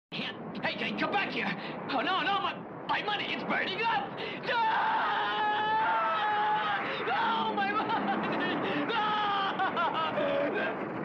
burning money

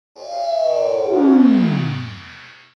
ooofff; cave

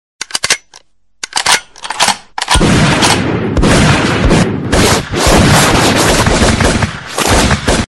gun

